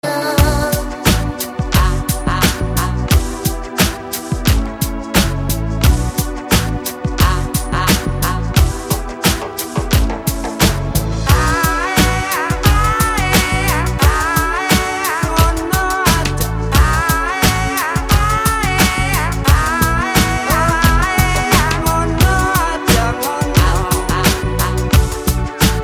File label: ocarina dream ringtone